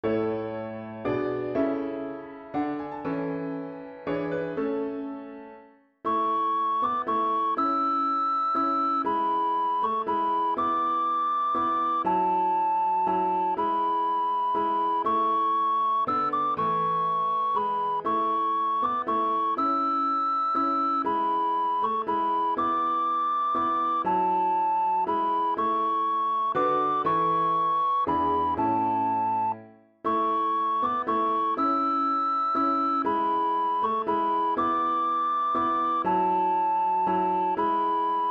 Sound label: piano